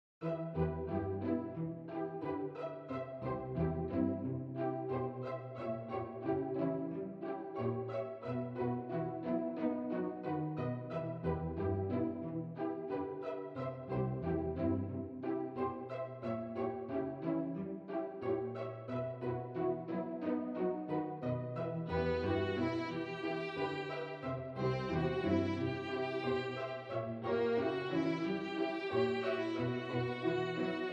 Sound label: Arioso Two Violins and String Orchestra